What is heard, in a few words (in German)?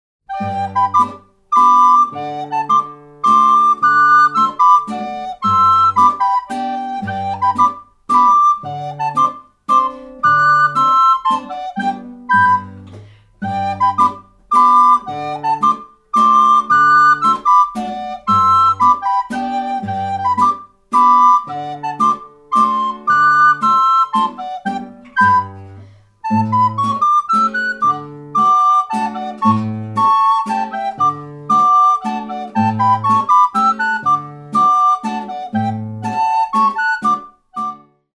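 Spielstücke und Mazurka, volksmusik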